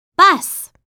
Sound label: bus